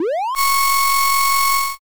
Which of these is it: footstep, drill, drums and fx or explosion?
drill